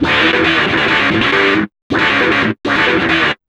GTR, Chemical Beats

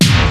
Bang, drum machines; EMU Planet-Phatt

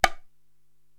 Bongo Lo Slap, Korg TR-Rack

